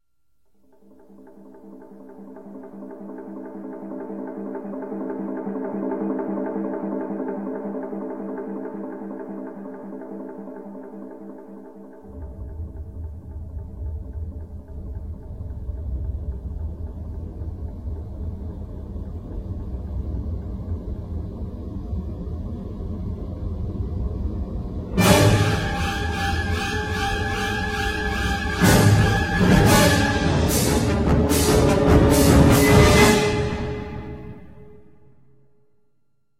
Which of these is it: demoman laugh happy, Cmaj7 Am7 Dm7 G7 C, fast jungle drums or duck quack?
fast jungle drums